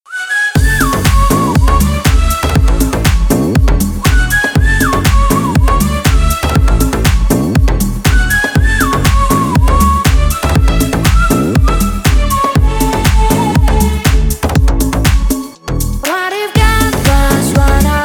svistjashhij klubnyj rington

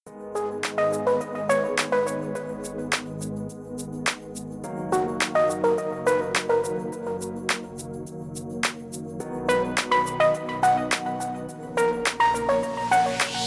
vostochnye ritmy na zvonok budilnika

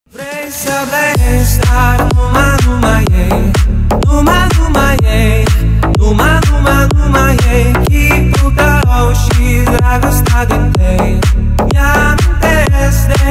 rumynskij klubnjak na zvonok

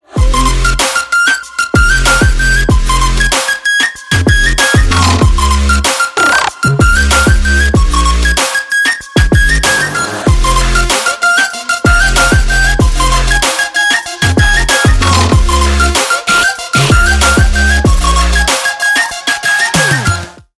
zvonkij trap na zvonok

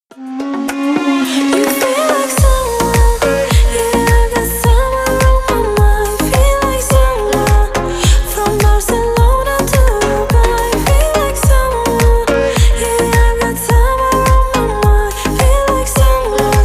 arabskij letnij rington

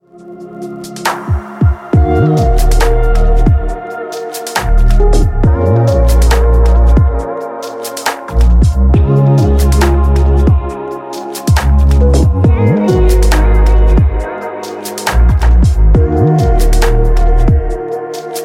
nezhnyj budilnik

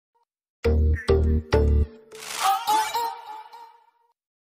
prikolnyj zvuk na sms

